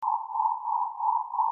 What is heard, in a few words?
bark